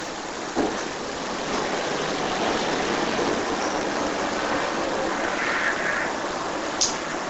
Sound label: unknown noise; Perry Schuch Hotel Saginaw, Mi; EVP